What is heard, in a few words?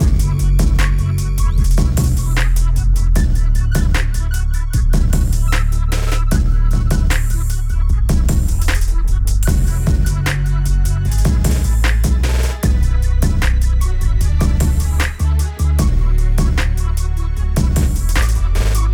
Hip Hop Trap Mix Loop; fortnite emotes